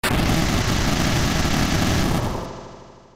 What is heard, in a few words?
inferno short; ff3us